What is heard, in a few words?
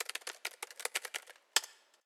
Keyboard